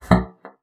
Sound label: BASSOON; Xsample VOL-6